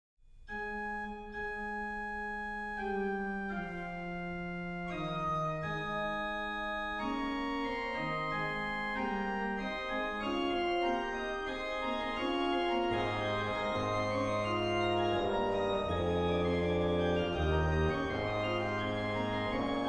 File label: Bach Organ Works Individual Chorale Preludes; BWV 757; O Herre Gott dein gttlichs Wort